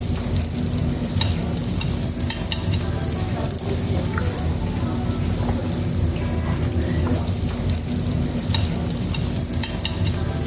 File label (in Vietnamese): tiếng ăn buffet thịt nướng xèo xèo